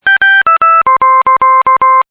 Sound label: User Select Low Attentive; dexcom g4 platinum